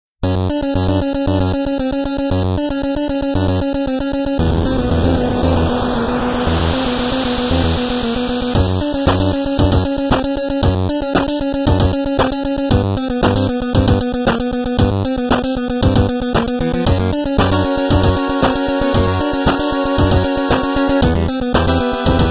8-bit muzyka na sotovyi telefon ringtony

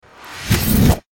zvuki volshebnoi fei

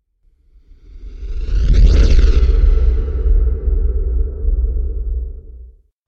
zvuki meteorita asteroida

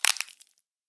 zvuki khrusta paltsev

